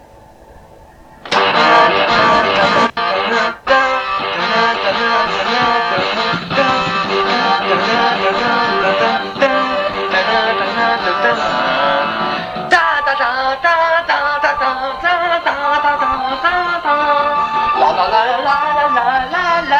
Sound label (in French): Demonstration Yamaha